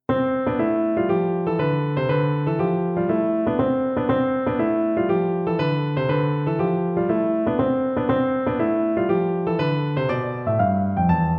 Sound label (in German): Tonleitern Spielen C Dur, Klavier